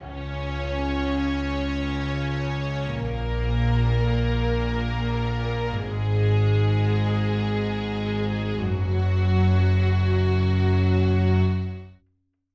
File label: pad